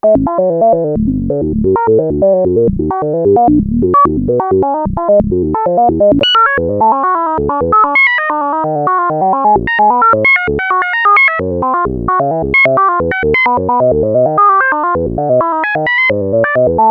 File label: RANDOM NOTES, Roland SH-101; Factory Patches